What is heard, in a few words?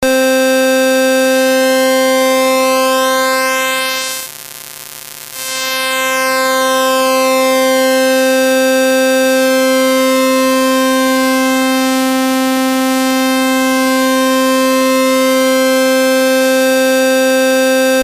v-synth juno